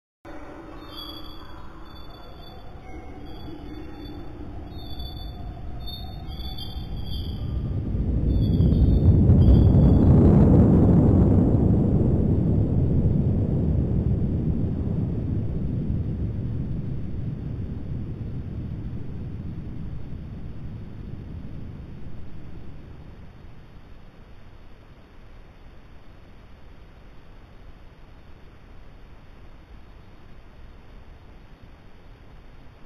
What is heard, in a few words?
Signal